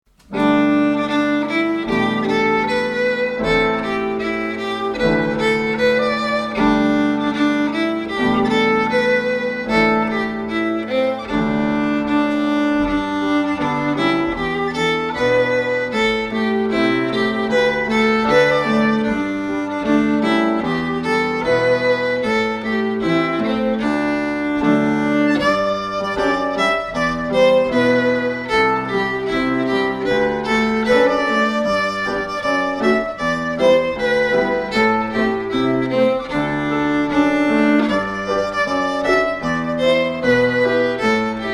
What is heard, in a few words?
Maris (Slow), fiddle